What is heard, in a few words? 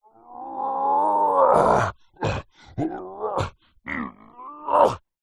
fantasy giant male vocalisations lifting struggling pushing; zapsplat